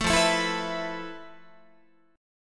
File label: F Major Sharp 11th, Guitar; strummed